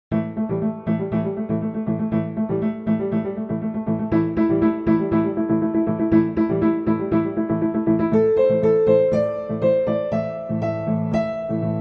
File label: dombra piano